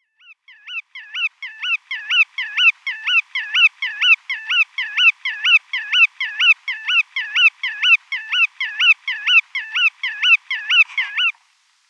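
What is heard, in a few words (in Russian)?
malyy veretennik golos, bar-tailed godwit voice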